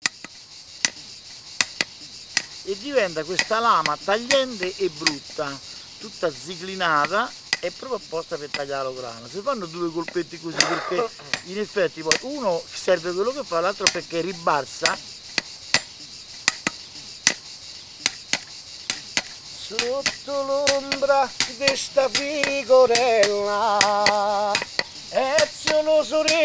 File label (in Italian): Affinatura Del Soreccio Canto Di Mietitura